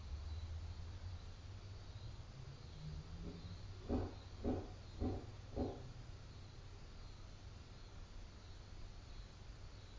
Static Empty House Located on Main Staircase

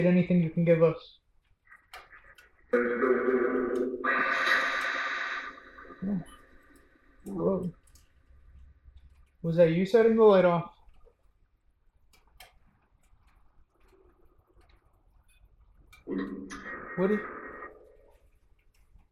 Attic Phasma Box; irish townsend house investigation

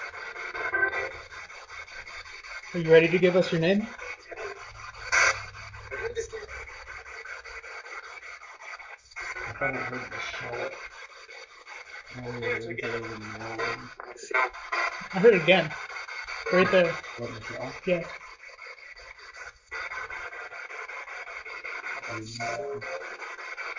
Womans Room Spirit Box; wexford general store antique shop return investigation

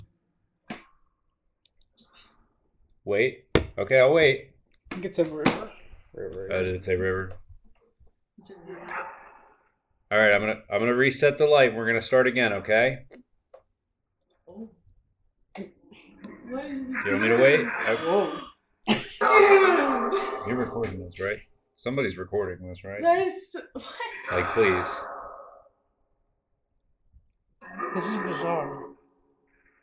Tarot Card Room Phasma Box

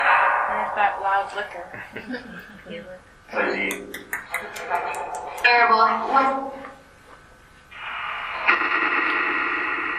Phasma; Bedroom; private residence freedom road apartment investigation return

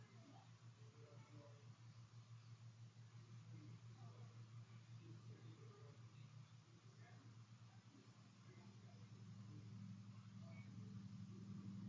Empty Room

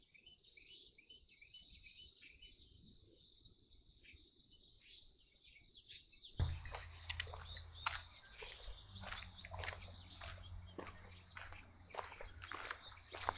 Static Overnight

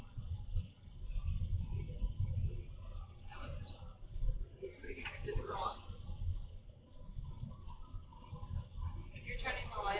Basement EVP

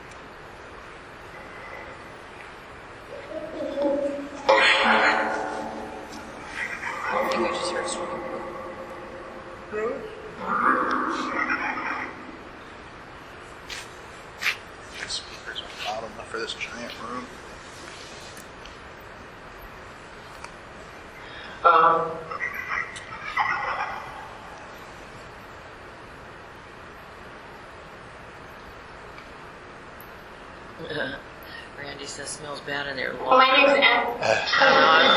Pool Phasma Box; beaver area high school investigation

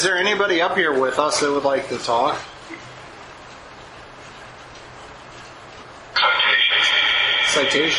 Attic Phasma Box; gill house investigation

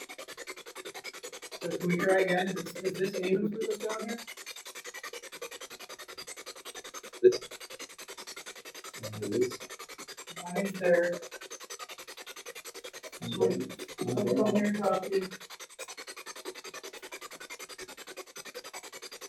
Janitor Room Basement Spirit Box; poasttown elementary school investigation

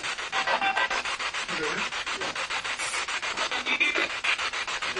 Spirit Box; Civil War Wing 3rd Floor Across form Armour Room; madison seminary investigation

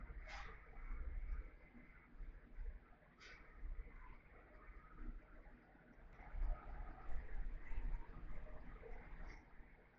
Dining Area EVP; caliente pizza draft house crafton investigation

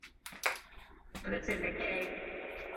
Basement Open Room Phasma Box

